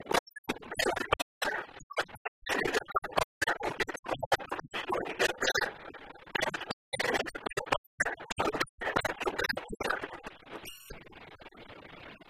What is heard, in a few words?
fire